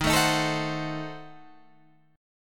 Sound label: D sharp Diminished 7th D sharp, Guitar, Open G